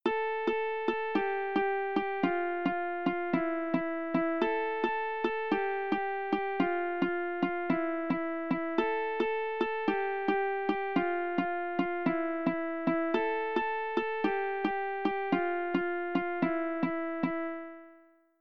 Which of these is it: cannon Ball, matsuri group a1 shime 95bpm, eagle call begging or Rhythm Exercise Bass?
Rhythm Exercise Bass